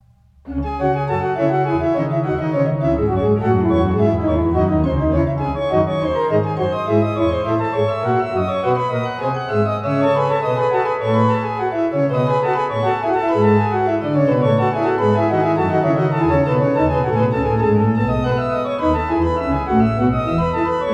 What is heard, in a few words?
Prelude C minor Prelude; Bach WTK II C minor